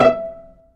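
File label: STRINGS PIZZICATO E4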